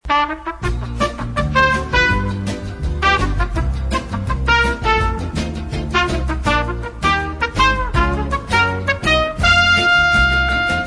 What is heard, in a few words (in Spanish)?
musica espera separador musical melodia; SONIDOS WHATSAPP